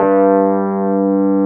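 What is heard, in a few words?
Wurlitzer G1 - L; USB Soundscan vol.09; AKAI; Keyboards Old School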